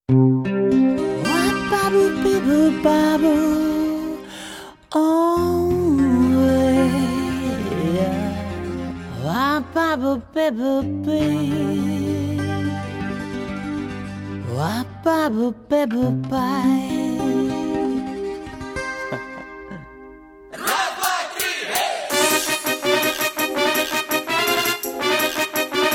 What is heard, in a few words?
brass minus